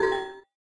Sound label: Tips HUD